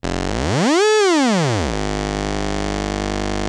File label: ARP 2600 G2, synthesizer